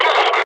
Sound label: Pain; Warframe; Lasrian; Battle Barks